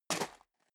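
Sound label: step leather shoes gravel medium single left, footsteps; schritte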